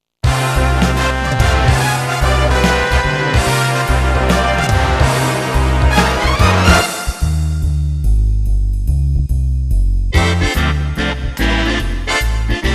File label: big band